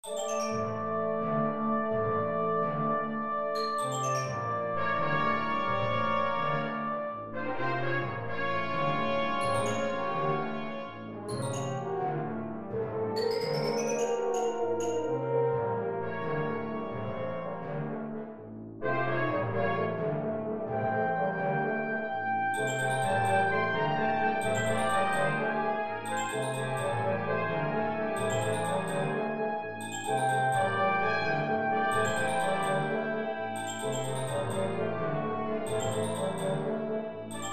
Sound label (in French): musique le train